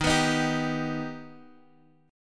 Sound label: D sharp Augmented D sharp, Guitar